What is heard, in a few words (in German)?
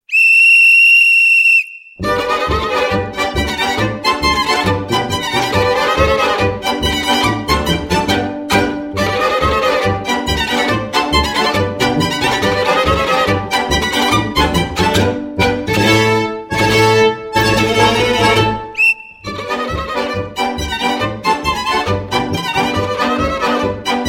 Polka schnell